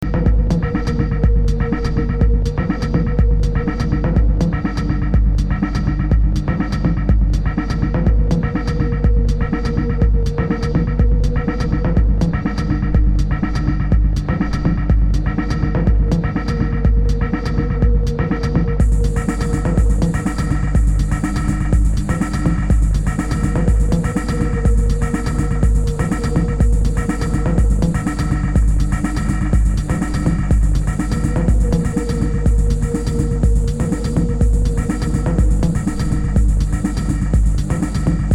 HISS

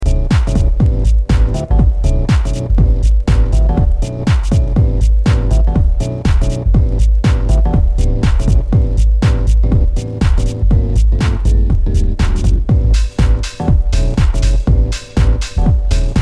hardcore deep house

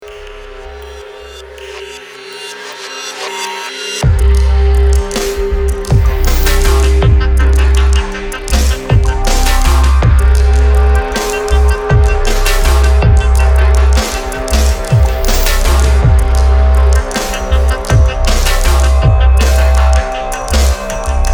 Ecstatic Trance

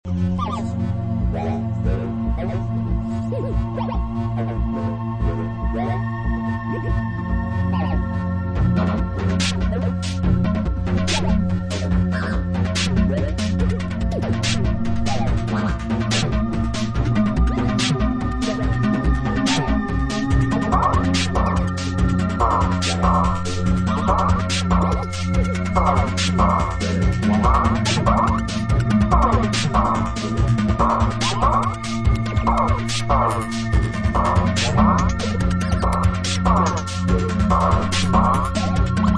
Piezo

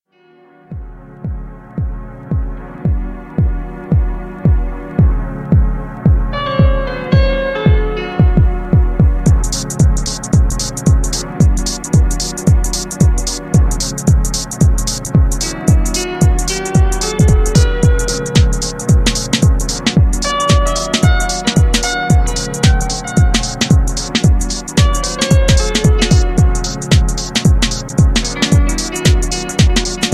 Deep Trance Mix

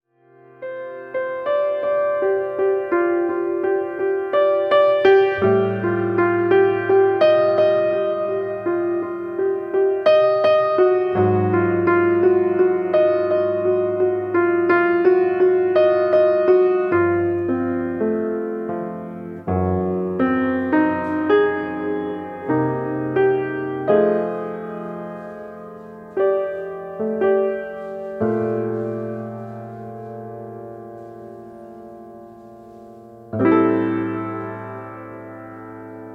improvisation

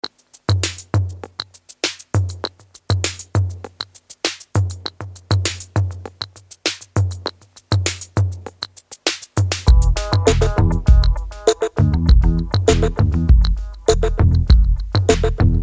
romantic dub

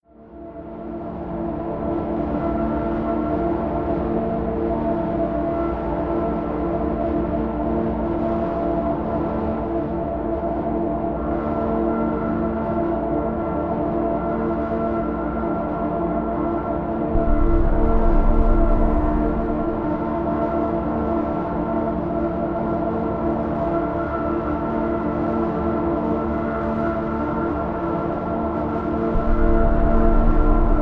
coin trap

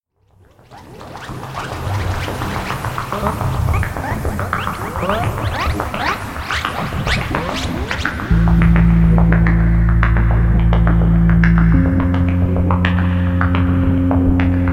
Jazz; Japanese Masterclass

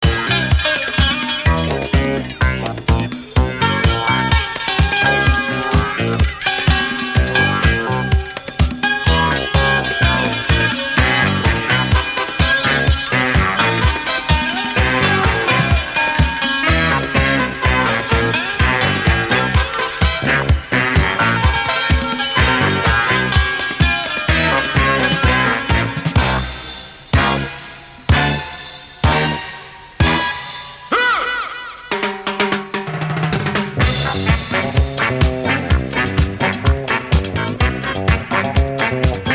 Disco Mambo

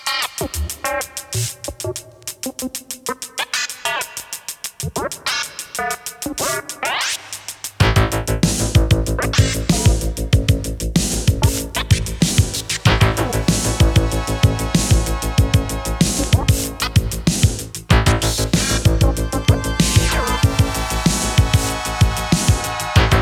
Acid Rain

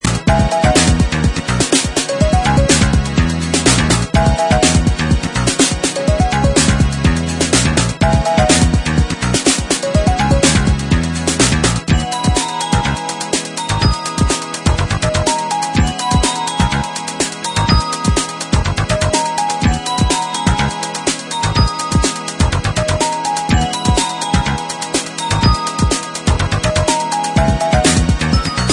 Jazzy Fluids

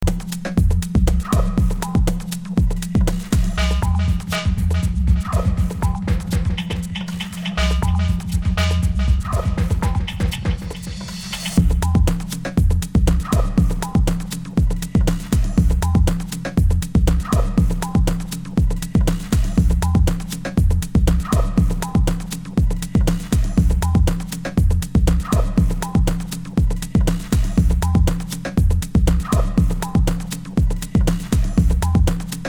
Ambient

